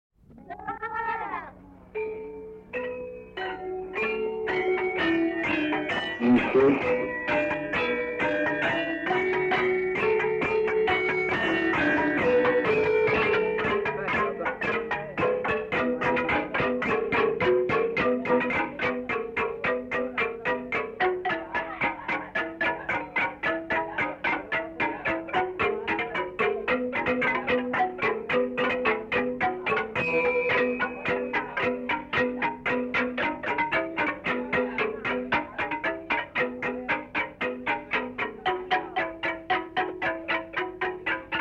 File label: gambang; Asak; Rejang; 1962